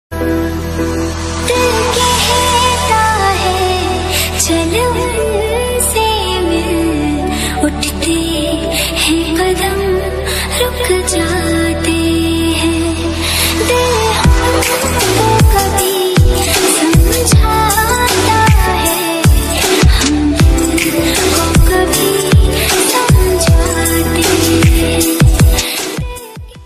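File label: guitar music bollywood ringtone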